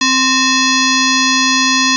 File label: synth, SQ80